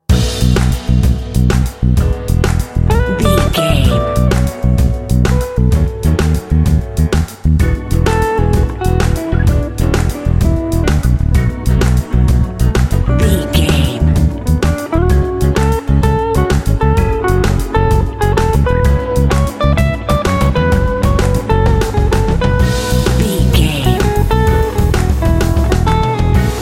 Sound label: juicy blues jam